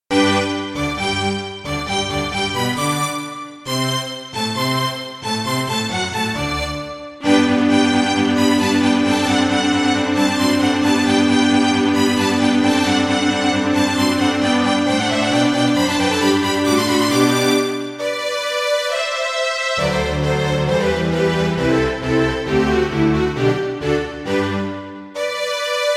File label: Yamaha MU500 GS MODE; Mozart - Eine Kleine Nachtmusik partial; classical